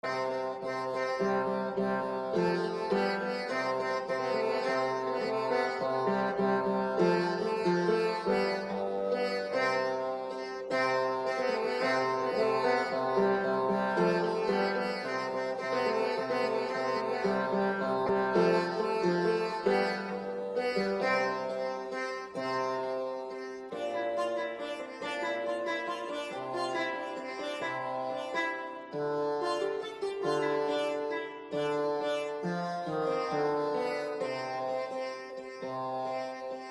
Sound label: bray pin harp